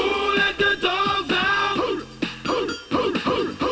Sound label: dogs out